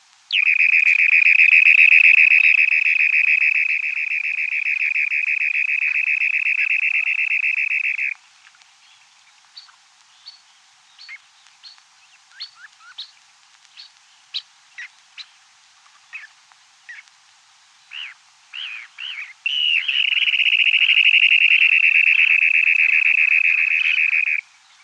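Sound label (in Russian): chernozobik golos, dunlin voice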